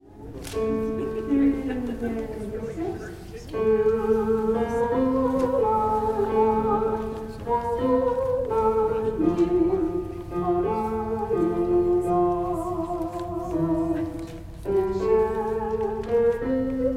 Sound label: tenor; Gather Hymnal